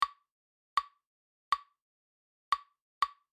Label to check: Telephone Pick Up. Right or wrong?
Wrong